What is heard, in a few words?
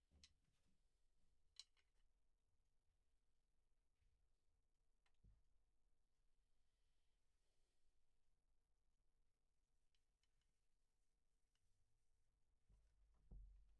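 Kit, drums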